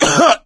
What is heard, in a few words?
choke; voice; source engine; left 4 dead 2; survivor